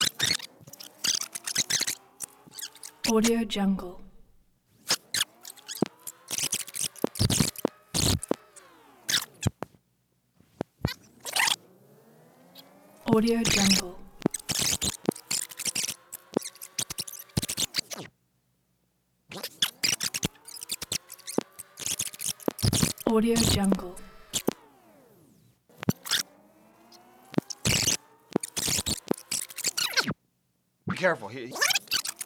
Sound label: tape recorder fast forward rewind voices